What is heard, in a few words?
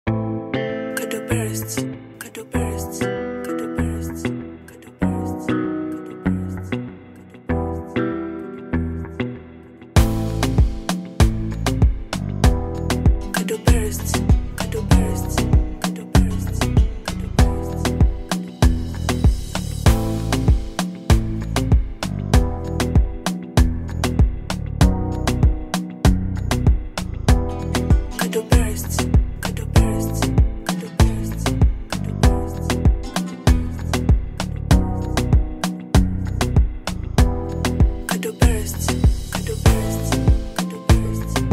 Instrumental Beat; By Kadobest